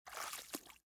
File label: step in water